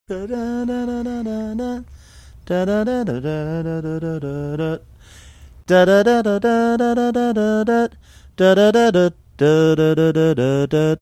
singing melody of song while trying to think

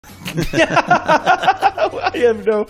laugh i have no

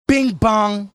bing bong; sidetalk

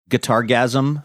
guitar gasm

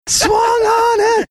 singing something

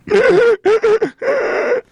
laugh

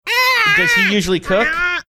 making cat sounds

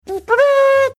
making mouth trumpet fanfare

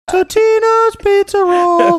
singing

